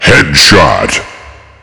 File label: headshot; left4dead2; cso